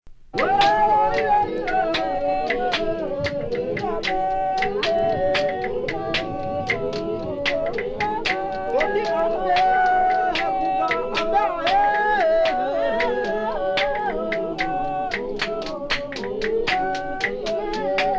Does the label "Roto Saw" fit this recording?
No